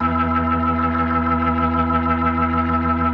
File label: E2, B3